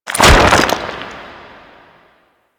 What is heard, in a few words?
Rock Stairs Start B; Ambience; Orokin; Warframe; Orokin Moon